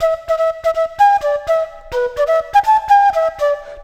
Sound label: flute, ethno; eJay